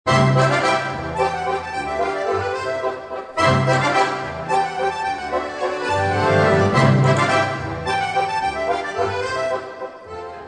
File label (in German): Spantanz